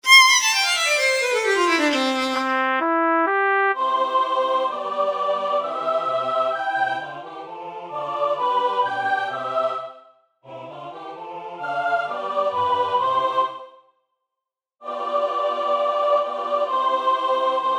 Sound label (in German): Sopran; Allegro 2; ReginaCoeli